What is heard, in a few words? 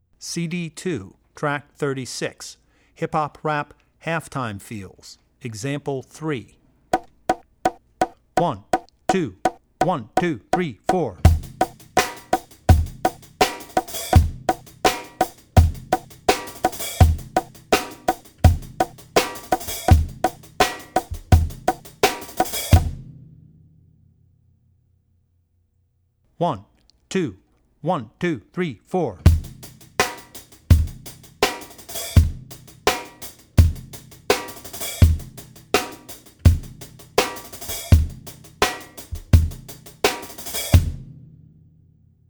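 Hip Hop Rap - Half-Time Feel, Drum Tracks; Drummer's Bible Tracks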